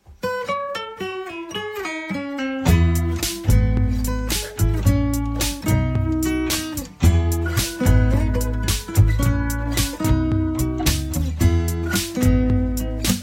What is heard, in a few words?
Jingle; 350 Hertz